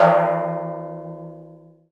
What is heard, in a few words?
PER Percussion